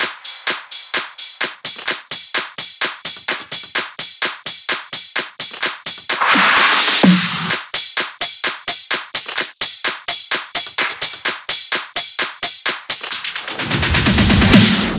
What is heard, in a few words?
Drum Loop 128BPM Full ohne BD Tonal; Multi Loops; Vengeance - Essential Clubsounds Vol.5; VEC5 Drumloops